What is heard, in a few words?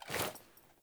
Foley Action Default Melee Swipe, Foley Default Clothing; Characters; Player